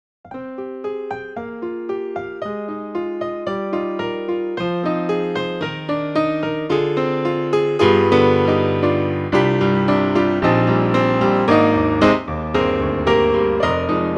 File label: Piano; IK Multimedia; SampleTank Free; free VSTi